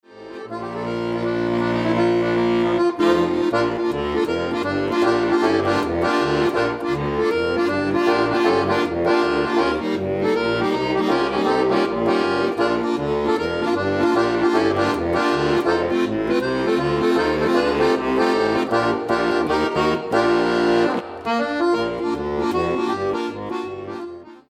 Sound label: accordion